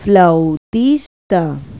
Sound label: flautist; Pipo-Aprende musica con Pipo